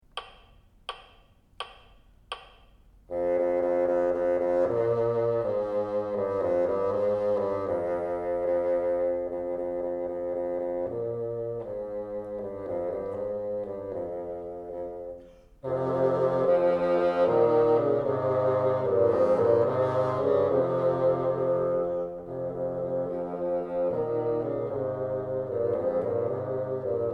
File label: together, bassoon